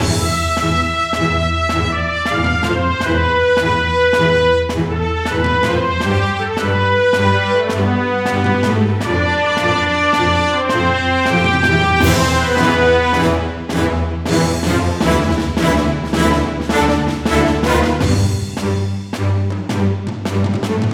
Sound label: battletheme, JRPG inspired orchestral battle theme - loop track; North Fantasy Music